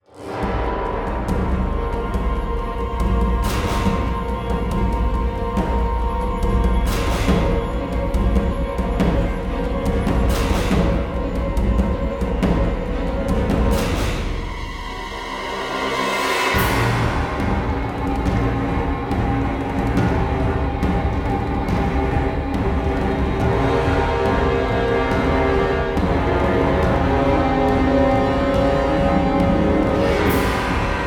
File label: Action High Intensity